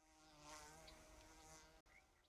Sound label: insect, MidDay; FontainebleauDemo